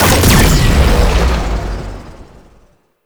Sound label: Corpus Projectile Shot; Warframe; Jade Shadows; Ascension